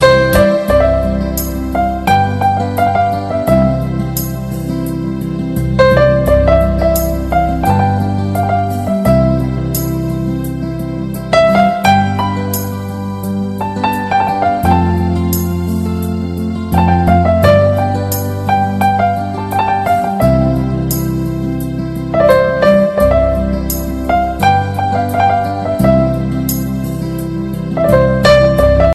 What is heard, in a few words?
old bollywood instrumental ringtone